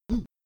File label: jump